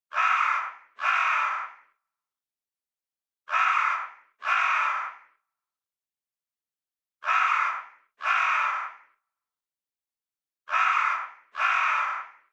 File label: Crow Real Ambi